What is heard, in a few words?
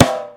Snare; StecMetronome